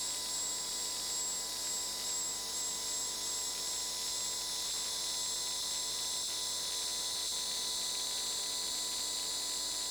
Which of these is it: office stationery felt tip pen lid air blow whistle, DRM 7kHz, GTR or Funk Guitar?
DRM 7kHz